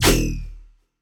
Tiro roxo

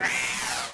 inimigo voador break

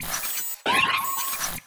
Plantinha ataque

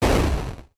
Sfx - Carregar E Atirar (Impacto); Neon Souls; PS4 PS5 switch windows xbox one xbox series xs

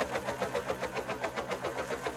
Drone tv fx Helice loop alta

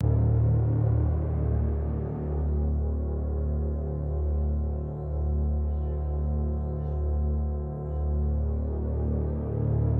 Sfx - Maos Flutuando; Neon Souls; PS4 PS5 switch windows xbox one xbox series xs

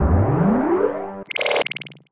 conjurando; Glorius dead

